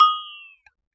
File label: glock fx; Univers Sons - Raricussions